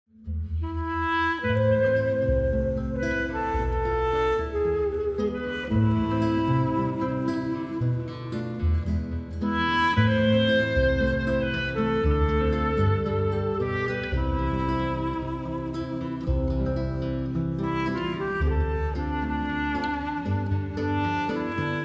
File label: Bossas Carnaval